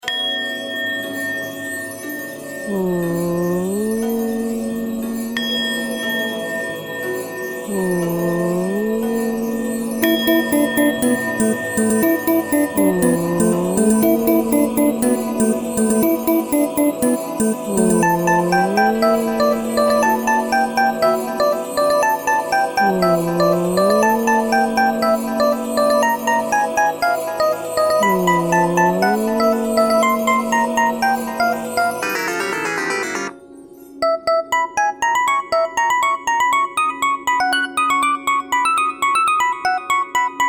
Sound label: type beat